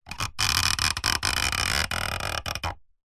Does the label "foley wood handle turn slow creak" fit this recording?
Yes